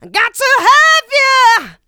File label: Female Vocals; Houseworx